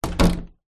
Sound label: household door internal bathroom close